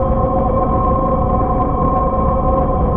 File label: warp engine; Earth and Beyond; EA Games